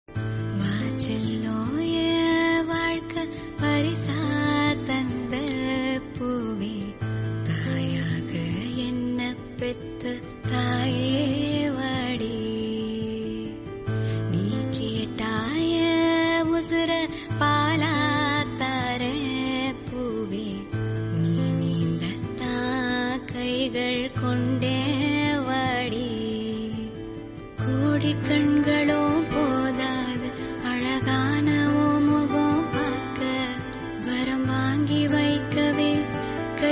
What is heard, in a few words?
tamil song